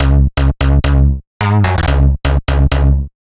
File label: bass 128 C#m, Electro bass loops; Anthemic